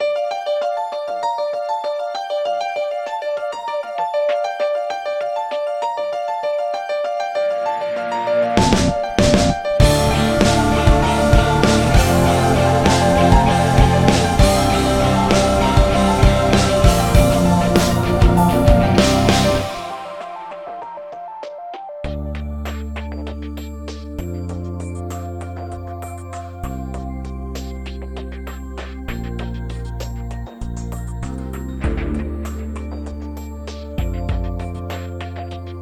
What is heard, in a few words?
instrumental